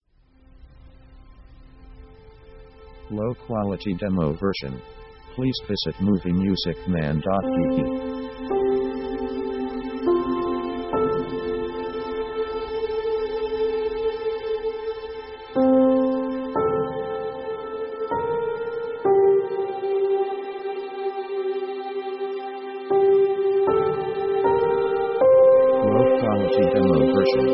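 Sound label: orchestra emotional 70s diva; world themes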